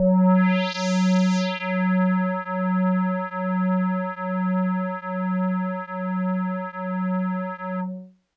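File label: Hologram Kawaii F#2; keys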